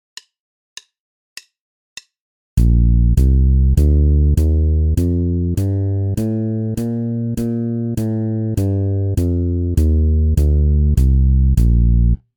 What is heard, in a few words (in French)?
Gamme majeure de Sib, basse; exercices